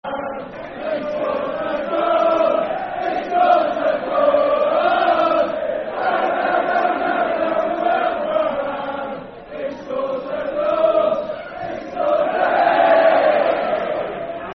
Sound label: Football Chant (Soccer Song); Wilbraham; Milton Keynes Dons Mkdfc